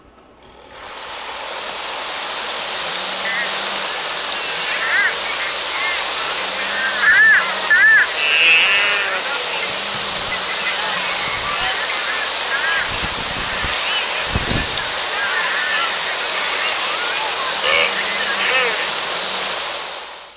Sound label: wall sound